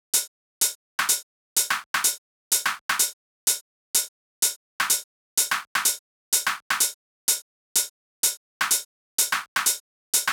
Drum Loop, 126 BPM E; Future House; Big EDM; Future House Tools; Construction Kits; Let The Beat Go

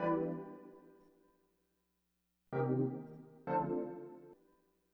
Synth; AppleLoops

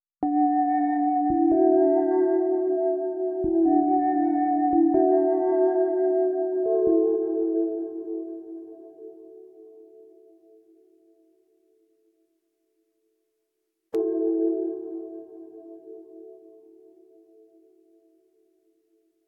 140 BPM F# Min Pad; Cymatics; Destiny Gold Expansion Stems; Cherry Soda

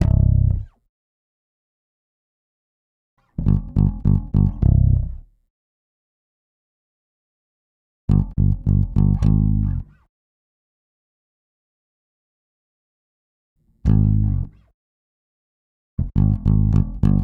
Bass A, 104 Cmin

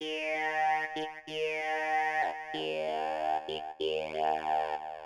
Synth, 095 Ebmin; Hip Hop Exotica; Big Fish Audio

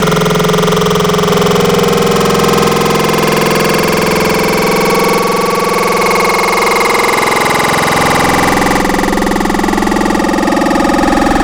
Vocal Puke; Wavetables; Cymatics; Vomit for Serum Vol 2

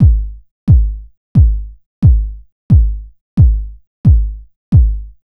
Kick, Drum Tracks, Amin; Hip Hop; big fish audio; Hip Hop Exotica; Apple Loops

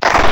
Clappy; Cymatics; Foley Wavetables Vol 1; Serum

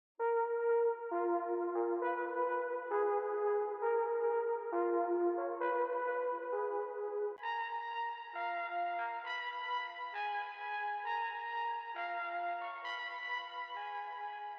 133 BPM D# Min Brass; Cymatics; Utopia; Destiny Gold Expansion

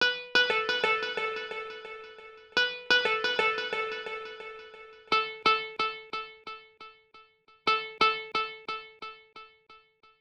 Synth; Hip Hop Exotica; big fish audio

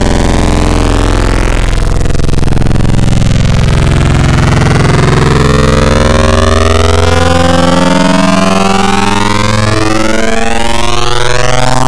Plasma, Wavetables; Cymatics; Wavetables Collection Vol 3; Serum

